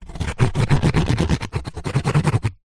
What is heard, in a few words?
foley cardboard scratch sharp object